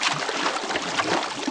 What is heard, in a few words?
SWIM, moves; ageofwonder